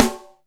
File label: MICRO PIC, SNARES; Back In Time Records; Big Bang